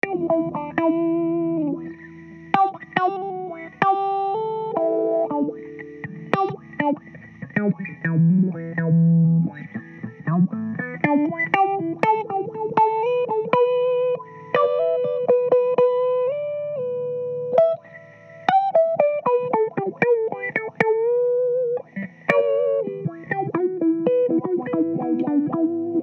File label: Jazzy Lil Funk Groove, Em 120